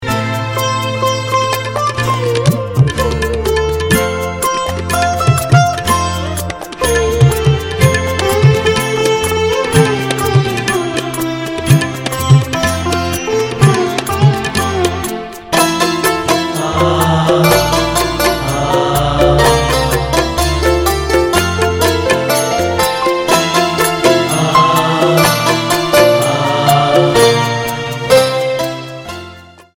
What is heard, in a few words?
Instrumental Sweet Piano